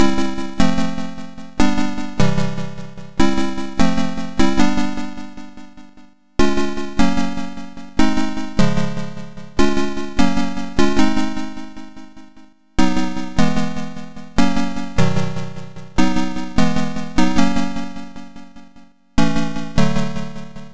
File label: docking success, atari; goldrunner II